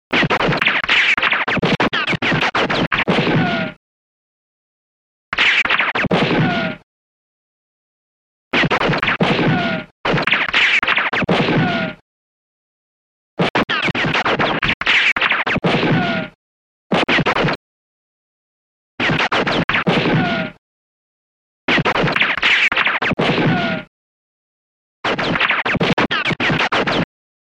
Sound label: ricochet rapid fire